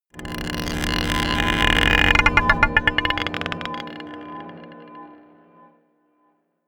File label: scrapes marble pot dark, drones